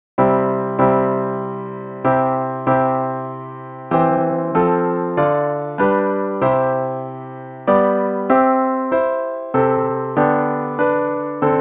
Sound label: CHIMES